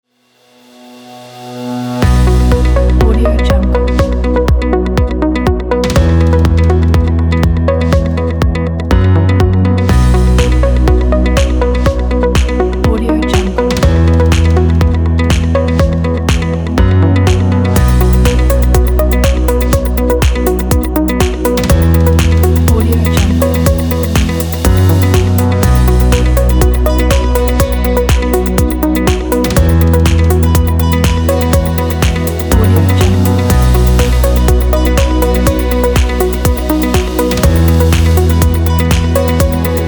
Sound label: optimistic light